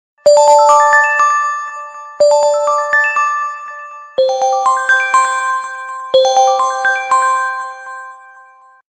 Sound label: Samsung Candygram, SMS Tone